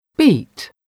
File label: beat